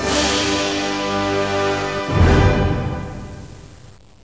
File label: TROMBONG, ORCHHITS